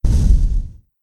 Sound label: magic puff of smoke vanish; zapsplat